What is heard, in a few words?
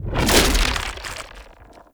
Arm Turret Throw; Warframe; Man In The Wall